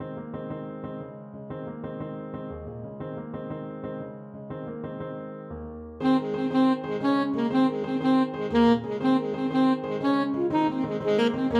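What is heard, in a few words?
alto saxophone; foxhunter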